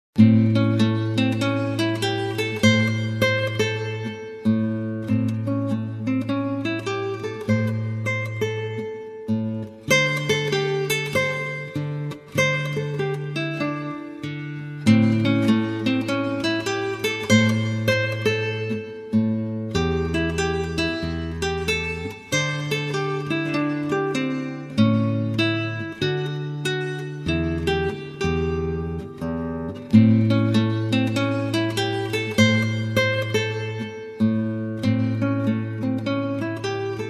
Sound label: Scale, Gitaarboek